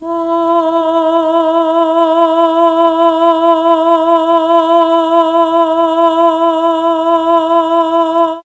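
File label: E2 female, chamber, mellotron; CHMB FEMALE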